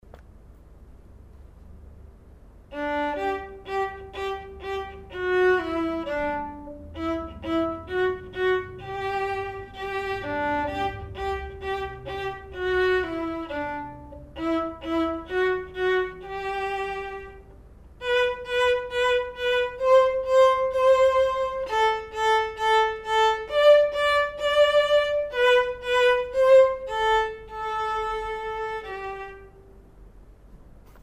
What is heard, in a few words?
perf vln